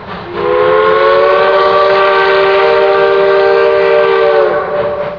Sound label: haunted train